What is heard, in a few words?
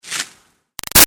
Arrow By Ruffles Fast; Bow And Arrow Sound Effects; Bows and Arrows; Combat